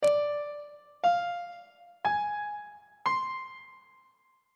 f melodic minor 7th chord 6 is d half diminished 7th; on treble clef